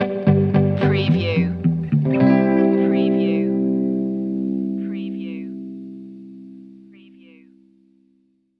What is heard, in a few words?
charmed stinger